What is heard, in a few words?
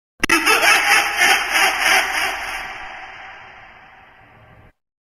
Scary doll laugh